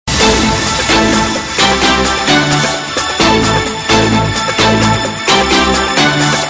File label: SmS Violin